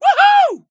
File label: woo